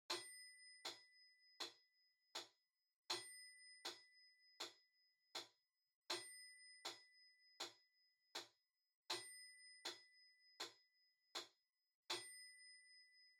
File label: Metronome (Far-Bell-Click-Slow)